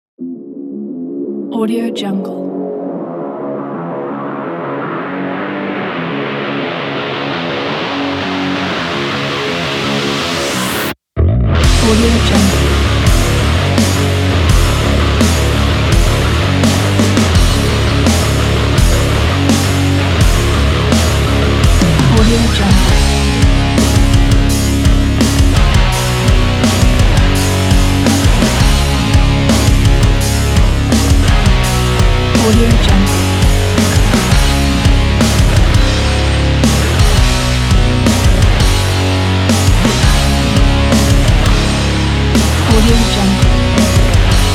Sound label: metal sport